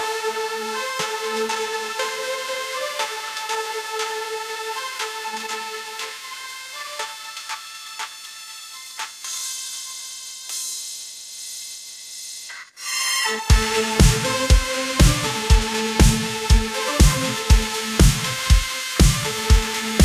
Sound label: pop anthem